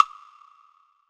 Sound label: Jam Block Echo; Sonic Foundry (Sony Creative Software); Joe Vitale Latin Percussion